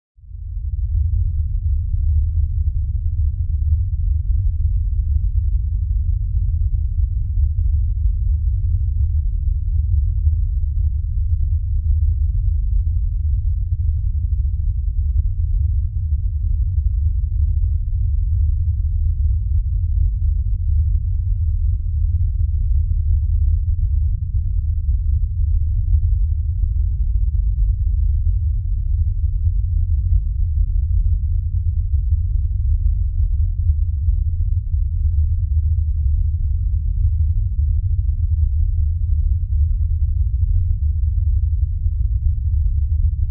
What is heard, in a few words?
Earthquake